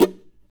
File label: NOISE, guitar